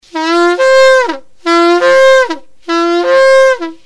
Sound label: shevarim, shofar sounds; yeshua; feasts